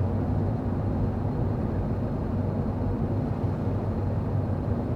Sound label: police car drive int; Original TK Sounds